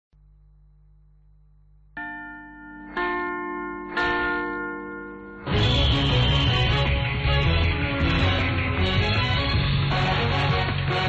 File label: Sports news, تیتراژ آغازین اخبار ورزشی